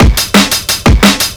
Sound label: 175, Breaks; EZRollers